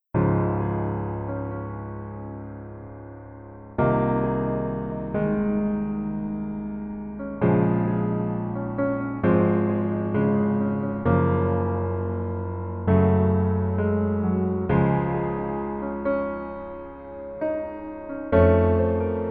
Piano ver 멜로디MR 남자키

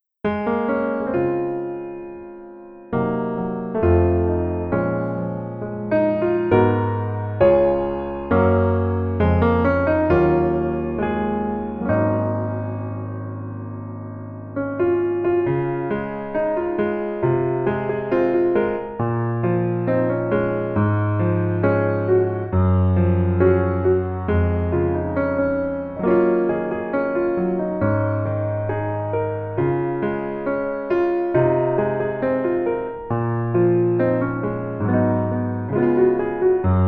Piano ver, 멜로디MR, -1키

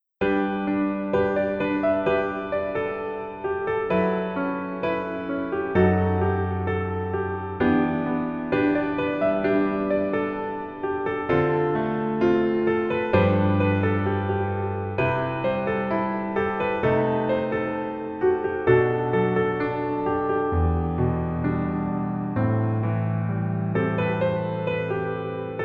Piano ver 멜로디MR

